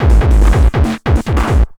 PW 909 140, Hard & Loud Techno

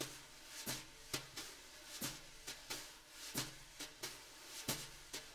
BRUSH LOOPS, Living Drums

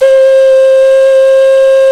Clay Flute

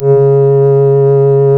REED C#; Big Fish Audio; Synclav FM

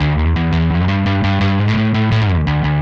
Static Bass; Sonic Foundry (Sony Creative Software); Indie Rock Anthems; Session 13 - Static

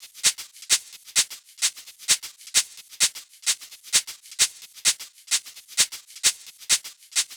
Shake Loop 130; Equipped Music; Loungin House; Hh-Shake Loops 130

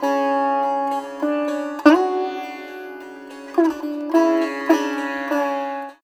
SITAR; Spectrasonics; Heart of Asia

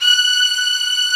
ARCO STRGS M; OMI - Universe of Sounds

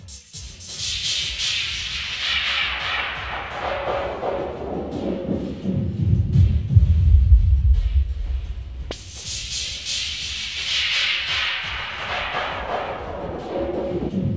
FX LOOPS; Zero G; Malice In Wonderland

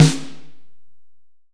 HALL SN, HALL SNARE

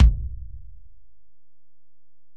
Ac BD, Alesis DM-PRO; 300 Drum Machines

